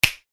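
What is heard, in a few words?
finger snap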